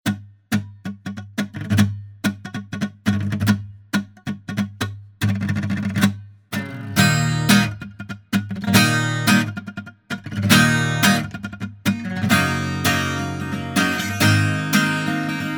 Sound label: maple attack, guitar